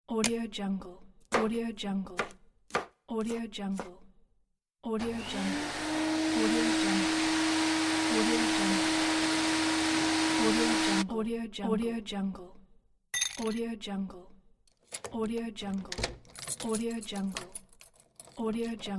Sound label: sounds at home sfx pack